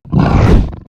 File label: VAFER NIPPER ATTACK